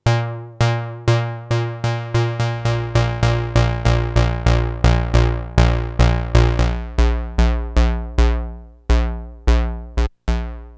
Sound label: sub, novation super bass station